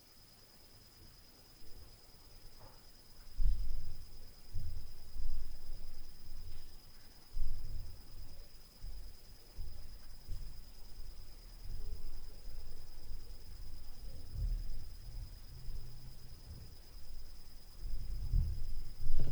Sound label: Upstair Noise; Night Noise